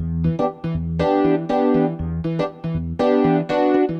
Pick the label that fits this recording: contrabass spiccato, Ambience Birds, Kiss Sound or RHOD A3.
RHOD A3